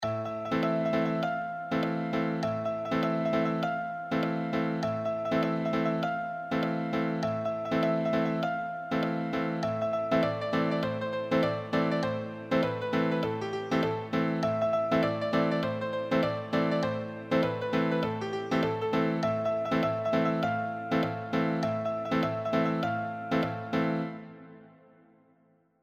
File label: solo; piano